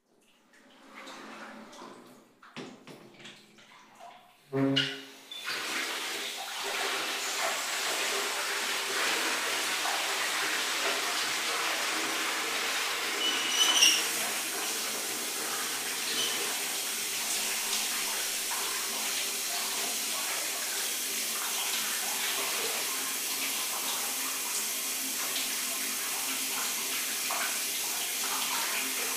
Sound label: shower